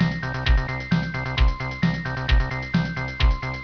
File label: music; tzmgolf